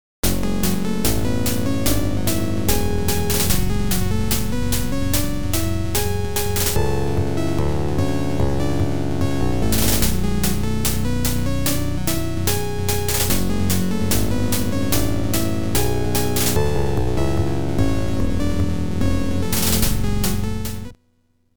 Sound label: OPL2; Game Over